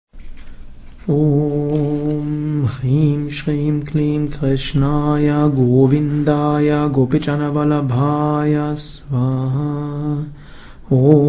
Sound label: Om Hrim Shrim Klim Krishnaya Govindaya